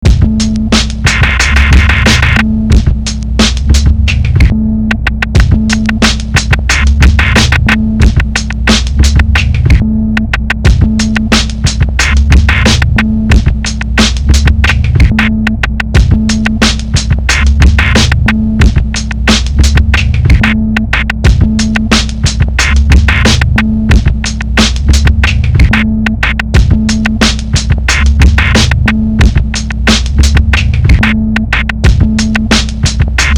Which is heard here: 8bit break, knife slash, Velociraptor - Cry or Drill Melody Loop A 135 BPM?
8bit break